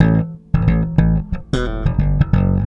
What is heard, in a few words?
ACID JAZZ